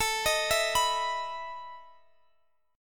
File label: E Major 7th Suspended 4th, A